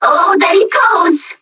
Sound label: player hurt, metropolice; npc